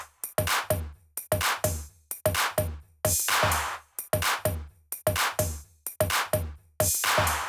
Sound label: Kit 7, 128BPM; Venus Kit